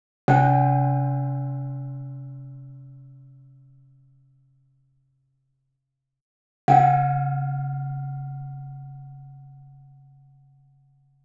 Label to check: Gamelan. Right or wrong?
Right